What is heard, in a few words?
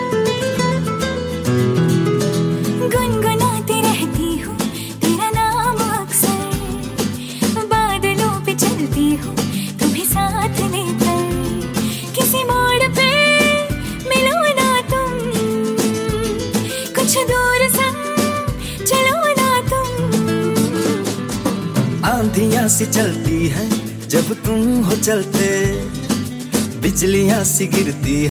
bollywood romantic ringtone